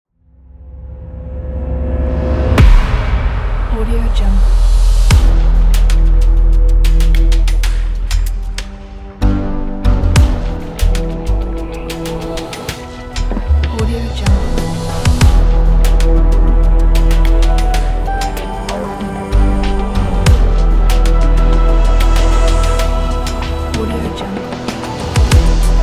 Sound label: military trailer; audiosport